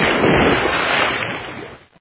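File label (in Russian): water single noisy sharp splash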